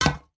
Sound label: household womans deodorant aerosol can put into container with others